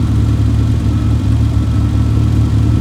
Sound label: Ford Mustang Idle; car simulator 2